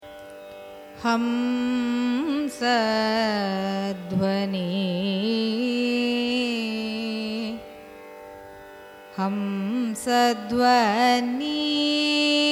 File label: raga hamsadwani signature; carnatic music